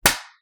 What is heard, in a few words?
smack; shweets sim